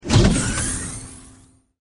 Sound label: Rocket Fire